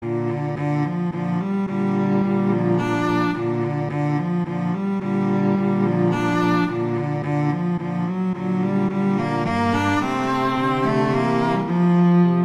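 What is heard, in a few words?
Etude, cello; Popper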